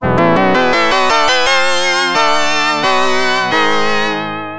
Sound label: End Level Tune; Gods; Genesis